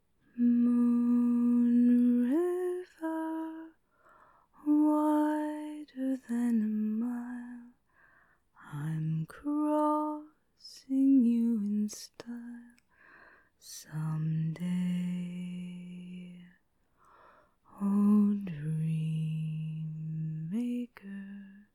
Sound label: F, Singing